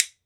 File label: WOOD BONE, Celtic Percussion